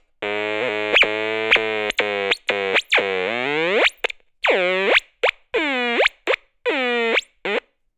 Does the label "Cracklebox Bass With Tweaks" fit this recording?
Yes